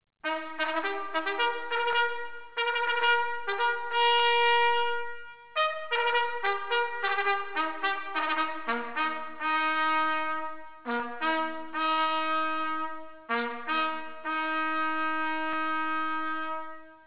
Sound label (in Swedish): portstang, signaler; dragoner